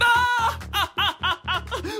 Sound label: Laugh